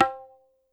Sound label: Tabla Nah, YAMAHA RM1X